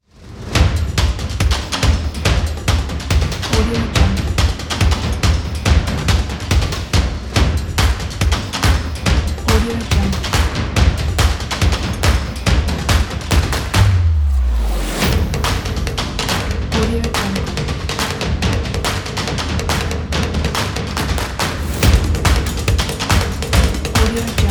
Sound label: sport percussion